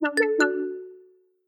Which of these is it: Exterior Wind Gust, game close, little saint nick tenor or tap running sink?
game close